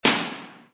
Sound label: Cathedral Room